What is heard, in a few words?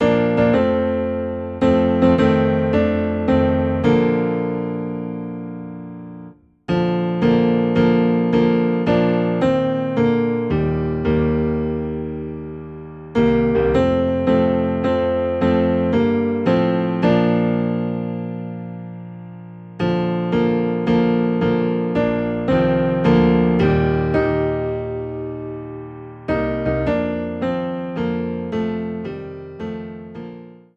Piano Low in B Flat